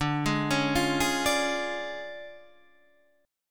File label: D Minor Major 7th Flat 5th D Guitar Open D